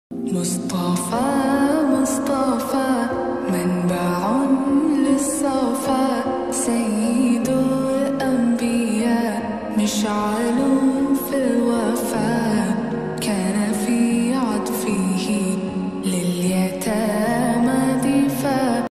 lofi, Arabic ringtone

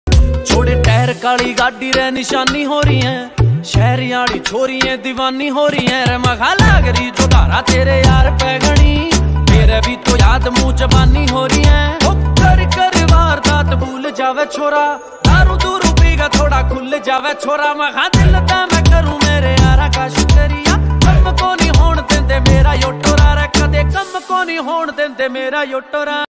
haryanvi ringtone

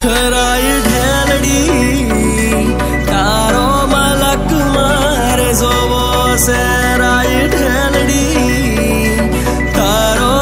gujarati ringtone

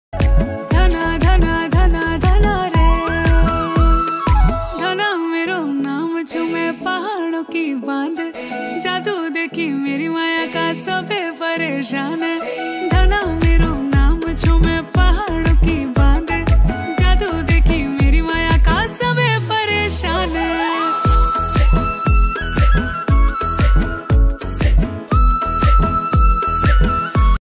uttrakhandi ringtone

